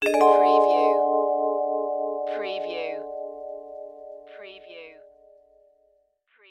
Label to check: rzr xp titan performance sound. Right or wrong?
Wrong